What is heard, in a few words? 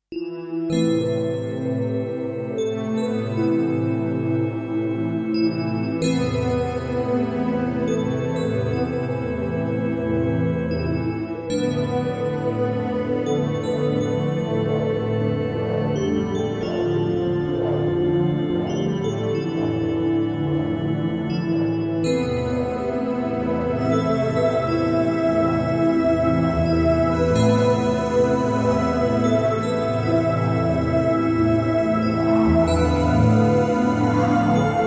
atmosph